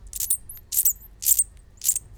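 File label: Diplomesodon pulchellum screech juvenile; Screeches occur already in three-day-old pups, but these calls are still very distinctive from the adult ones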